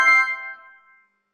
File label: achievement